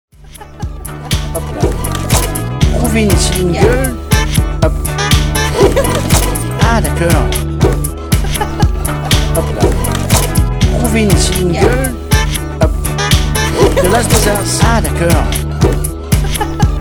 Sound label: groove